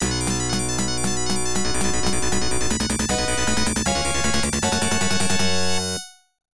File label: Unused Jingle; super x mitchell version; arcade